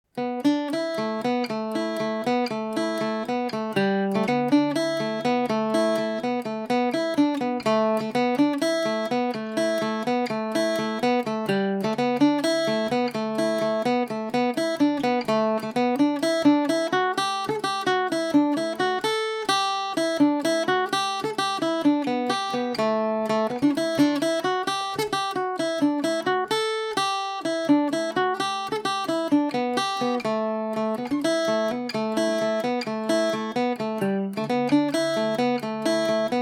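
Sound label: A dorian at 40, irish mandolin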